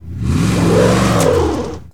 Auto Tires Spin Tires; Automobiles

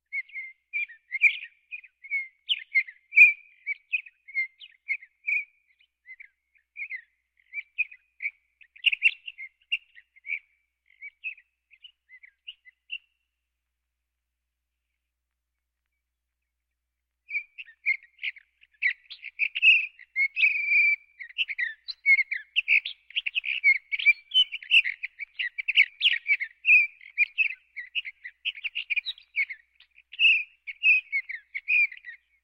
Birds

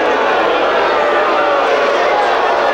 Baseball Crowd Boo Loop; Crowds

